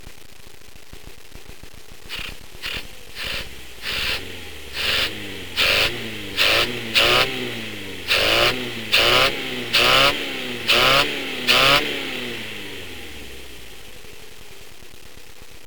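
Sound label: advanced 5 after top dead centre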